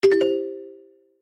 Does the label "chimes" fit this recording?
Yes